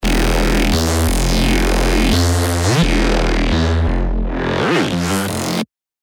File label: Great Awakening; revealed serum 2 reese renaissance